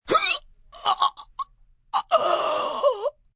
Pain Throaty, Pain, male, hit in the throat, stabbed, agony, moan (human voice); Sound Effects:Voice; Voice Box